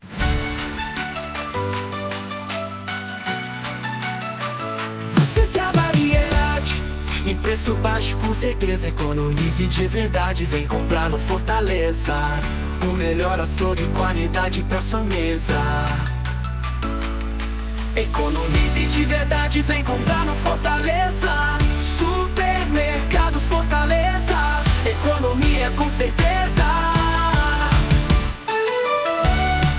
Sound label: Fortaleza Supermercados jingle